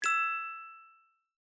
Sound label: Completed Sound; Microsoft Todos